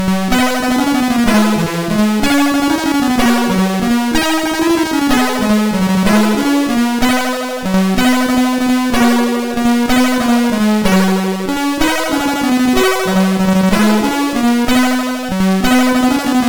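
Official Father Christmas Game, MOS 6581 SID chip music, games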